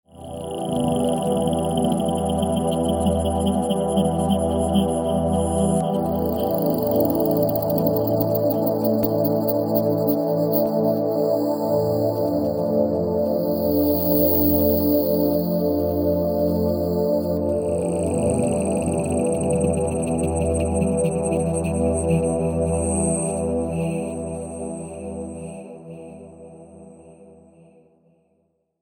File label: synth